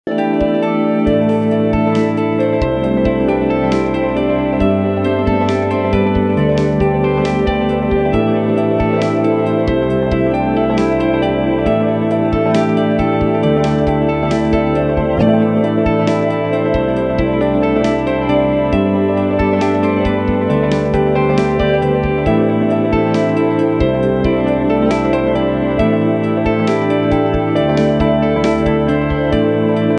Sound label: music fx compose an instrumental track featuring guita